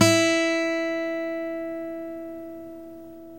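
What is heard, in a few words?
GTR E4 AC M; Synclavier Sampler Library; KEYS AND GUITARS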